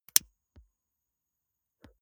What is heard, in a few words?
lighter electric switch flame on blow out